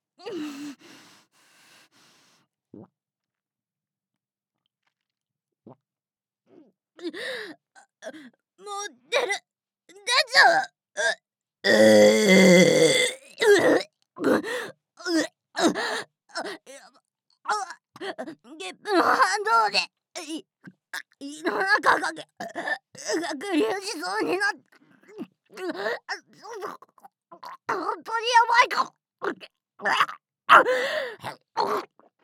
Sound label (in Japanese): ザーメン ゲップ からの ザーゲロ; ザーメンゲップ集